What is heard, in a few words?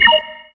menu click; sfx; starship trooper